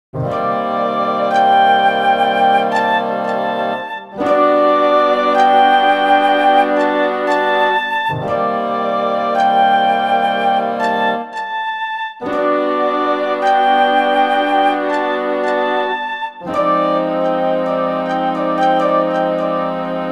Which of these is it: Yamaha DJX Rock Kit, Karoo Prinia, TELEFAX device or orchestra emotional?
orchestra emotional